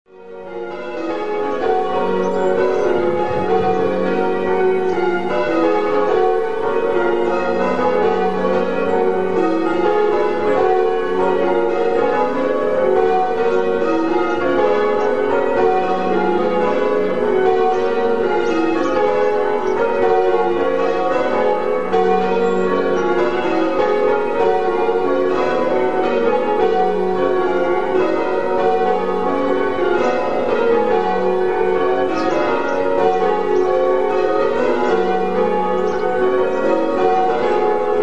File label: yorkshire, tower; egloshayle